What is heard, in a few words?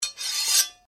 Blade Scrape Flick, Sword; Foley sounds for melee weapons; Combat